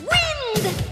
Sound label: wind; captain planet